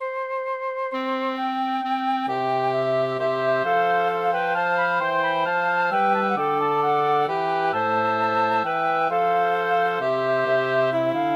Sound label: for woodwind quartet; janequin clement; de ta bouche tant vermeille